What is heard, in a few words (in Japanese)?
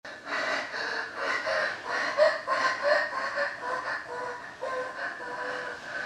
吐息